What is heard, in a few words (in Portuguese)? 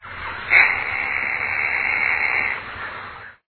gaviao-belo